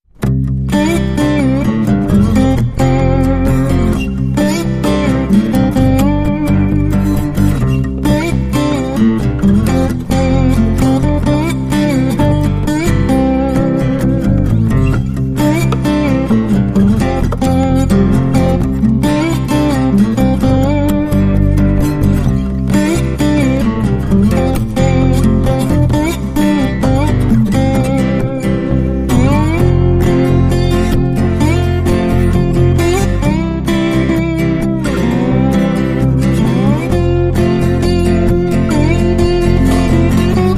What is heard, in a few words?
Happy, No Drums